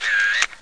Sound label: Shutter; Stanley's Sticker Stories (1997)(Edmark)